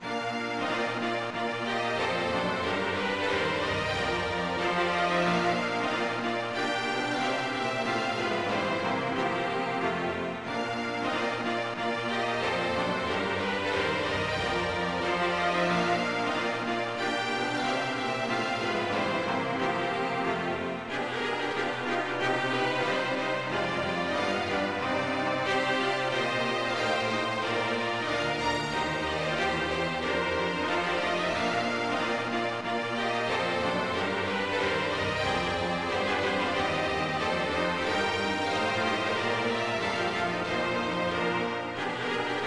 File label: quartet